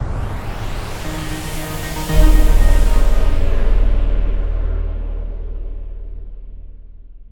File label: Transition End; Everspace 2